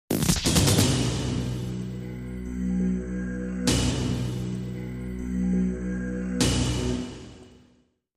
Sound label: Triple Hit